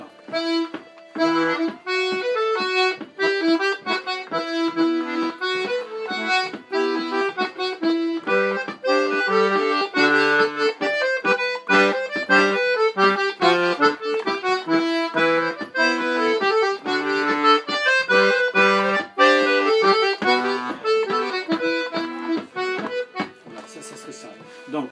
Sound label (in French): Plinn Ton Double